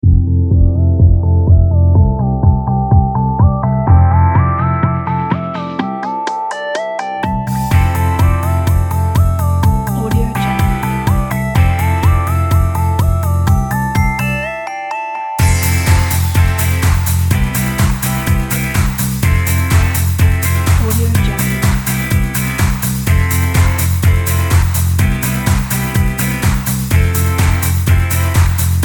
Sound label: energetic chords